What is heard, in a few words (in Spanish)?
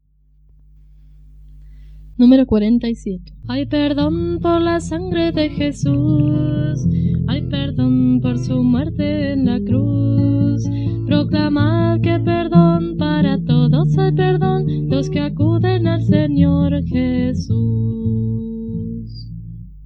Coro, himnario niños